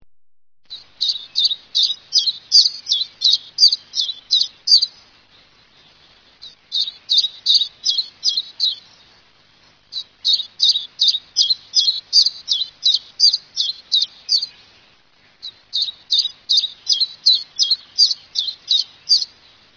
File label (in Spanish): mosquitero